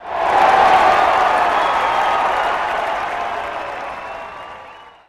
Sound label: BMX Crowd Cheer Medium; Crowds